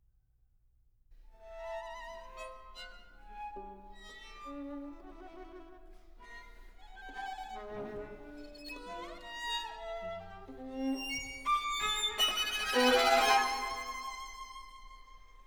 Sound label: String Quartet, Fließend; Six Bagatelles for String Quartet, op. 9